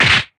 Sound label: punch short